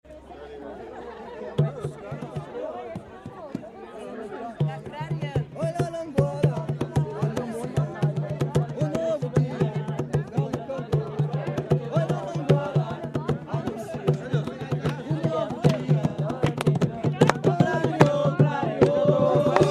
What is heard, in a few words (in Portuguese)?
jongo de guaratingueta na angola trecho